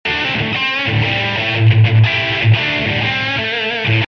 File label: 120 rhythm